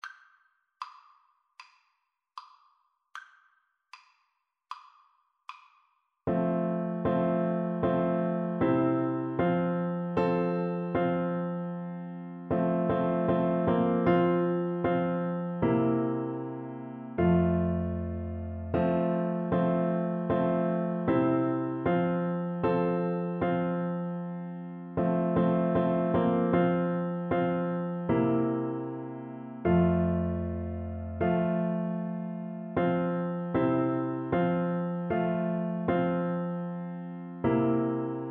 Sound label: blood of jesus, tuba